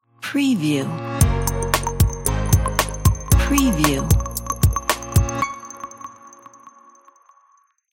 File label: logo